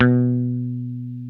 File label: BASS, B 3